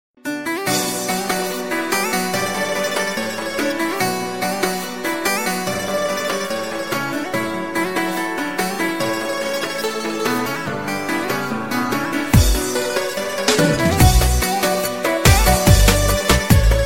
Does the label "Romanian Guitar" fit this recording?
Yes